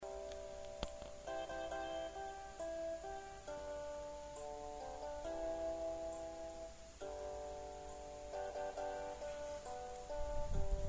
alto

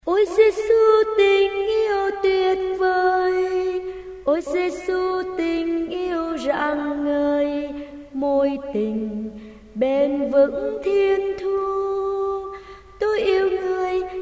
basso

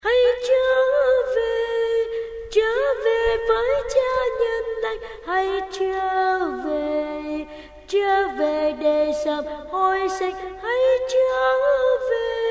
tenor basso

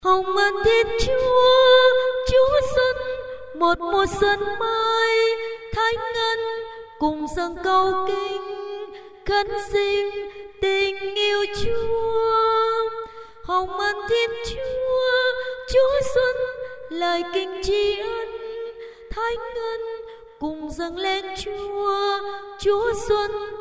basso tenor

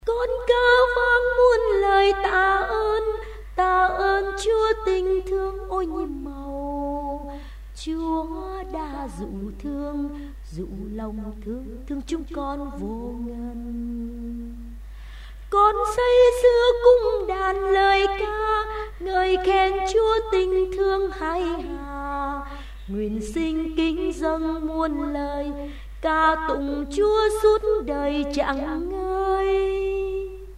tenor

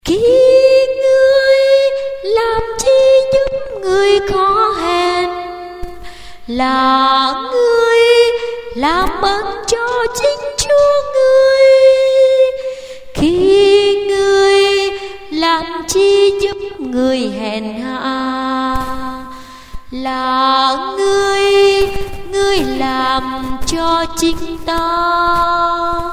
sop alto

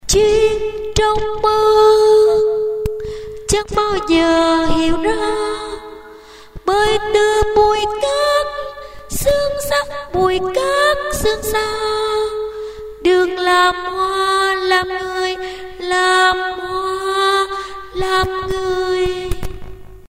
tenor

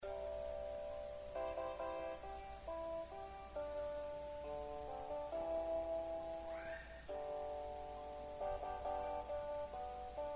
basso

